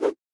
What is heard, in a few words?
sword swing; smite; npc; olympus